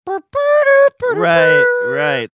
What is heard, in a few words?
mouth trumpeting saxophone riff saying right